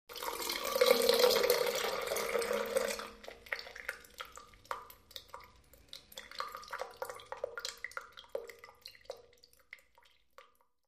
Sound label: Water Down Sink Drain